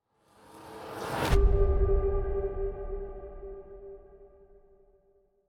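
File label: Music Percussion, Percussion Transition Pre race end; Forza Motorsport 6 Apex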